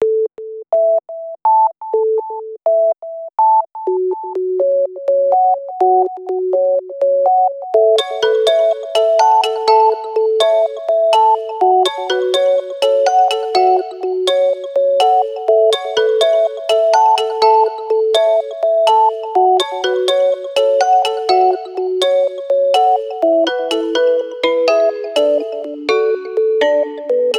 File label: Subtle alarm, phonetones; Sony Ericsson; PlusFourSix; SE Sound Project; Lars Edvall